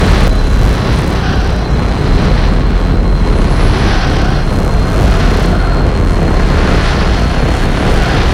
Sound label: psych drone loop; Katana ZERO